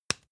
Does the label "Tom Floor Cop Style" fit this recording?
No